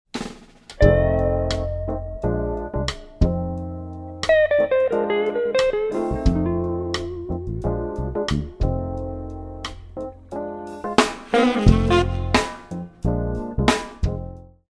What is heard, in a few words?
blues